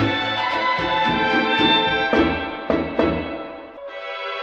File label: strings lambada, Loops; Phonky